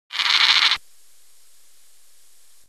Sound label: weak aggression call; Kommunikationslaute; emotionale Rufe